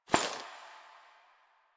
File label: Body Move